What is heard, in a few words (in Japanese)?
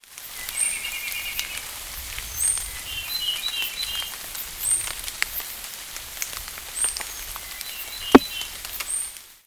akahige, bird song; アマミアカヒゲ; さえずり①; 鹿児島県大島郡龍郷町 自然観察の森; J-eco 鳥のさえずり図鑑